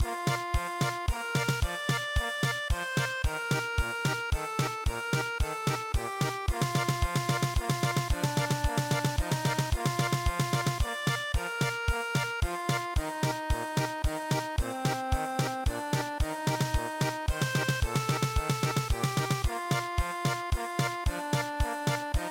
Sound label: German Polka, traditional